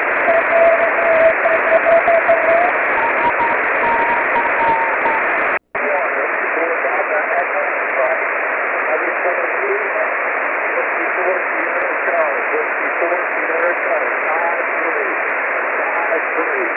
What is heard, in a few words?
10 GHz rainscatter